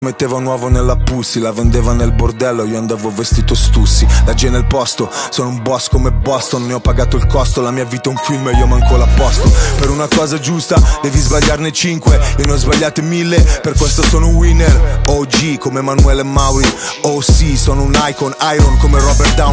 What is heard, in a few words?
rap